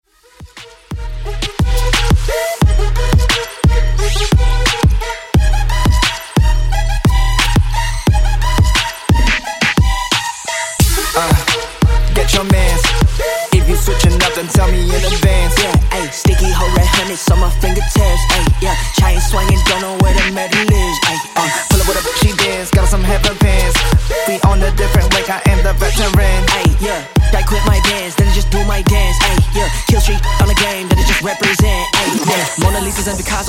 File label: Trap Mashup